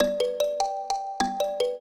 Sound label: trio, mbira; Mozart